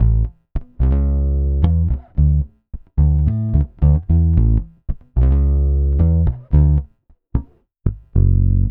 Pop, Bass

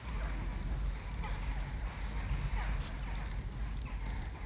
Ambience Loop, outdoor

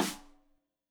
Rim Shot Stereo; Deeply Sampled Drums; SMDrum

